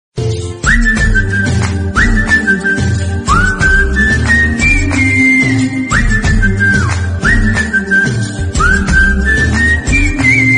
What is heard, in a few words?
Telugu Love Song Flute Music Ringtone